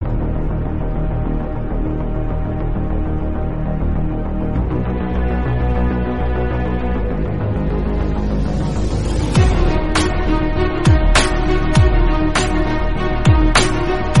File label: inspirational background music motivational background music